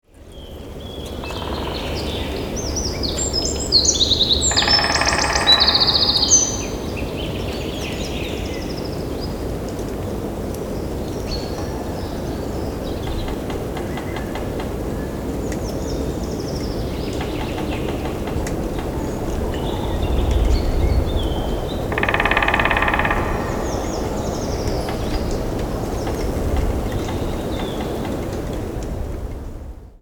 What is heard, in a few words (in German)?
Buntspecht Geraeusche im Wald, Voegel in Europa